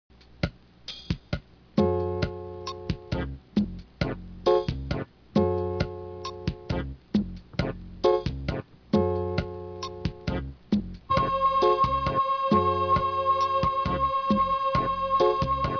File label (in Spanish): cumbia